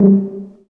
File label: TUBA C1, Solo Brass, stacc; Roland LCDP12